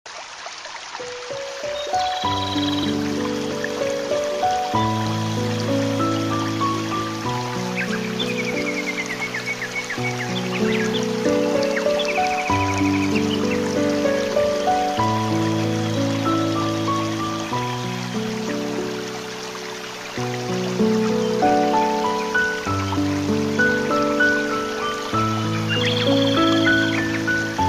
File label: bird and piano